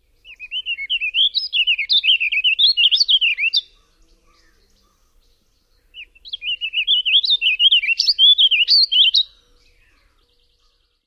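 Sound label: song, Warbling Vireo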